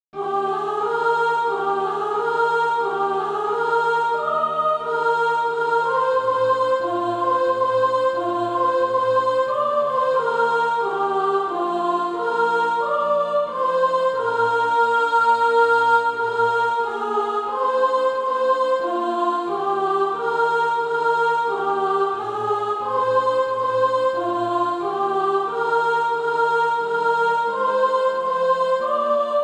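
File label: soprano, SATB Practise Music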